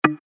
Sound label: Deny Sound